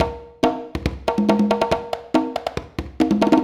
Conga Tribe (140); Beats

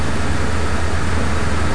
Car

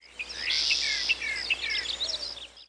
park; PHARAOH

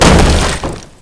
crate, impact

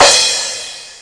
cymbal; 17 Bit Software 1: Collection A

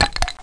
stick

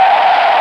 skid

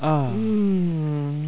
ooh

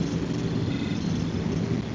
WATER; boulderdäsh; future

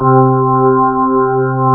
Full Slow A4, B3; SoundFont - The Developer's Choice - Volume 1

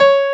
PIANO; DirectX6

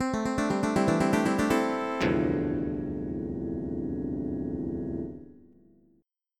lev over

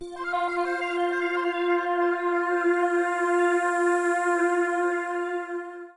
alien; ensoniq.gs1

